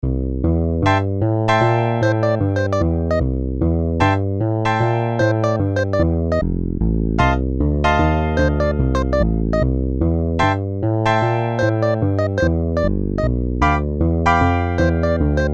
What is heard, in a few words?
midi blues